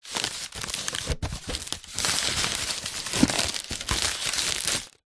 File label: foley balloon foil small inflated handling